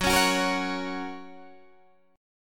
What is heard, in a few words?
Gb Major Gb Guitar Open D; 16,16,16,16,16,16; down; chord shape